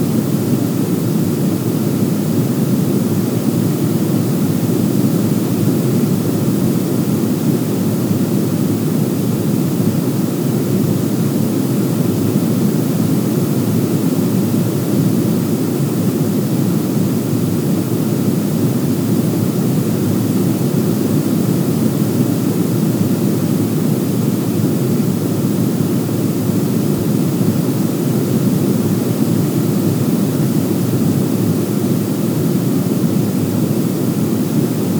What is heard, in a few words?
white noise